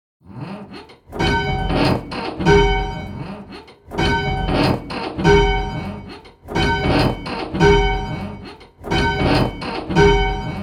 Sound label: bells, 6th; Just One Bell; Pebworth